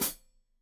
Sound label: Hat Foot, Hi-Hat, Cymbals Stereo